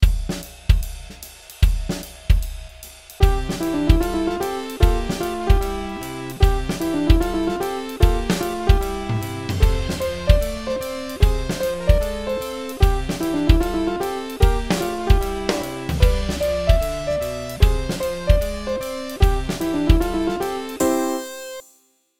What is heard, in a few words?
owen blues